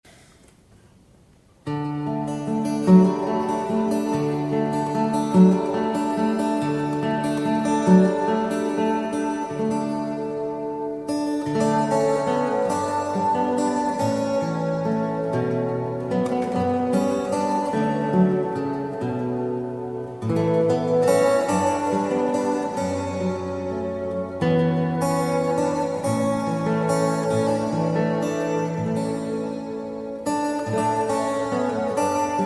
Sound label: fingerstyle